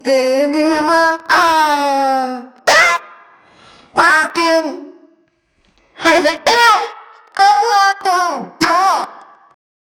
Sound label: whoosh like a riser, ascend